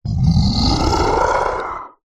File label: horror creature monster growl single; zapsplat